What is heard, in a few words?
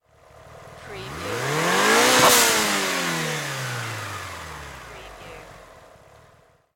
MITSUBISHI EVOLOUTION ENGINE SINGLE REV